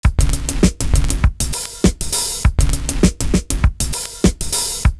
FUNKER, LOOP; Univers Sons

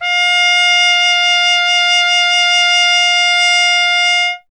trumpet, F 0; Miroslav Vitous; Solo Instruments VOL-1

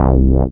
SYN SH, Roland; Sound & Vision - Gigapack I CD 2

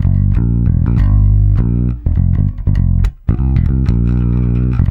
MEAN G, Bass; Spectrasonics; Bass Legends

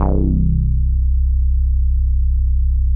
C1, Bass; E-MU Producer Series Vol. 6 – Analogia Project; Boom da Bass

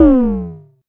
vermona drm1 mk3 tom

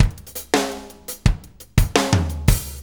Drum Tools, Loops

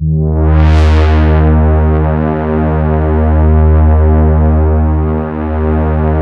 MONO PAD; PADS

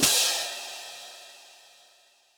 H Cym, Drum Machines, Yamaha DTXpress, Cymbal

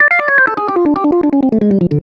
Funky Org Riffin down

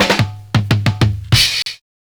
FILLS, 70_s Breakbeats; USB Soundscan vol.46; AKAI

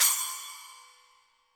HiHat & Crash, Roland TD-20; HiHats

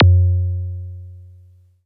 Percs, Elektron MachineDrum; SPS1 MKII

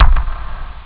bdrum; ST-XX Amiga Soundtracker Sample Packs

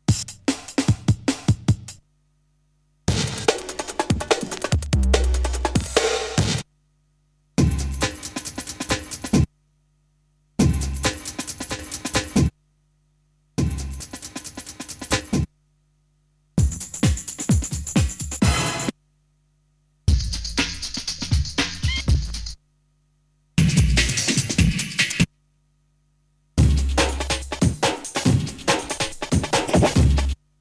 Breakbeats; Kniteforce; 1000 Samples Volume 1

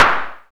SDS5 SN CLAP E; Greytsounds VOL-1; SDX PERC

